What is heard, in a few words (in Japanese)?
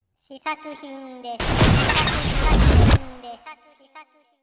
効果音